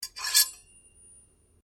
Blade Contact Scrape Fast Ring, Foley sounds for melee weapons